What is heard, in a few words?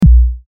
kick; audacity